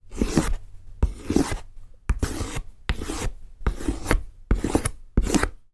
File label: industrial utility knife stanley knife slice plastic box